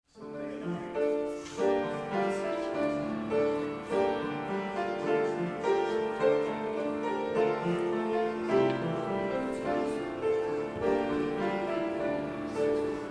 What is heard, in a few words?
Forever Instrumental